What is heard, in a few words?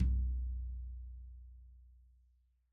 tom floor; soundscrate